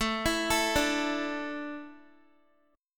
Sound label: A Suspended 4th, Guitar; Asus4; arpeggiated; x,12,14,14,14,x